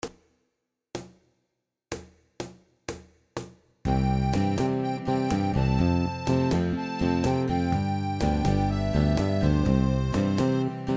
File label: fast, ukulele; podklady